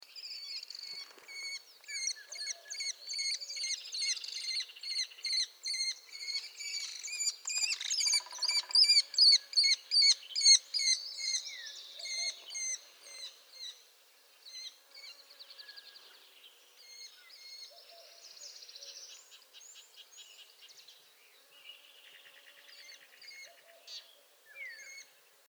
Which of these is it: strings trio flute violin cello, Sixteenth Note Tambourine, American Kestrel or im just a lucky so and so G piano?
American Kestrel